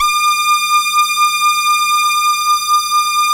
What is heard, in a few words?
saw res dry, synth